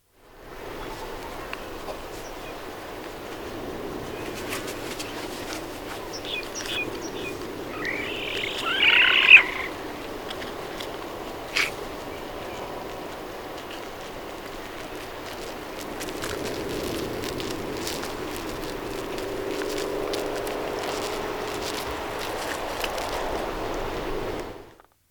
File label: Marine Iguana